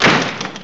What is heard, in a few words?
BIG RATLE